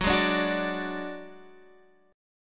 F sharp Suspended 2nd Sharp 5th

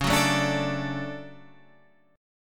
D Major C sharp, guitar

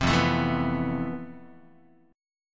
F Major Sharp 11th, Guitar; FM#11; 4A,4E,5A,5D,5G#,6C#; strummed

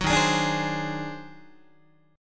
D sharp Minor Major 13th D sharp Guitar; strummed

